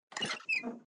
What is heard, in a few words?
lamp